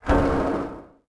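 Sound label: crane arm spin small; machines mechanical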